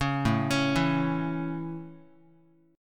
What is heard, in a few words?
A Major A Guitar, chord; arpeggiated; JGuitar